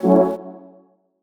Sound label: Synth Wave F#min